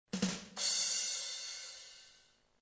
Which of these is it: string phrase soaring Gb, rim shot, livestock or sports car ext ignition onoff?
rim shot